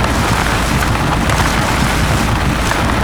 Tyres Grass Roll

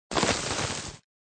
Rock crumble; SFX Environment; The Binding of Isaac

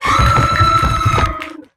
Leap Scream; lethal company

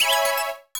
PLAY TRAMPOLIN

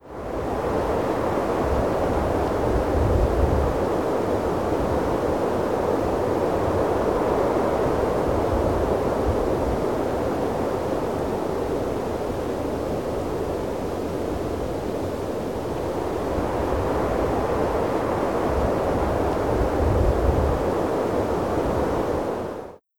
Cities Wind

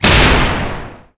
Enemy Bigclam Active Shot

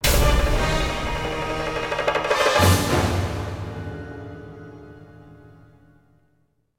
Bosscombat Lose; The Hobbit; PS2

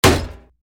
slotspawn; SFX Slots

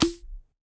Weapon Fizzle

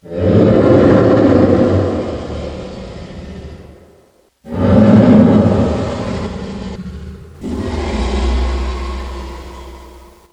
Woods - Bear Sounds

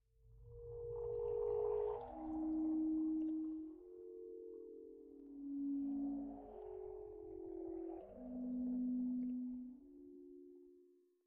Deeper; lethal company

